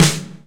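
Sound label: snare drum room; East West; Global Sounds; RY30 DRUMS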